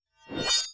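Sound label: cavalry mace, weapon; Battle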